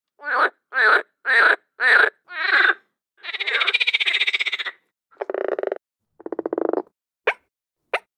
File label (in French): Grenouilles